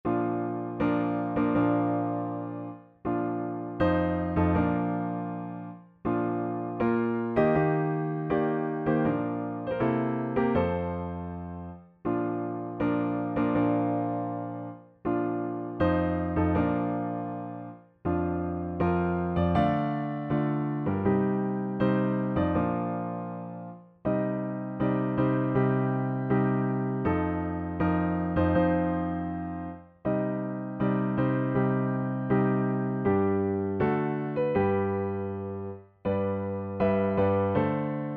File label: Bridal Chorus